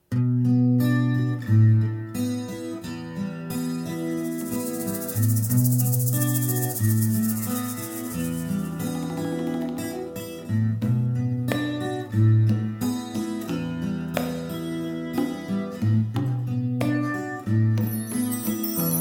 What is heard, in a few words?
deep forest; wild camel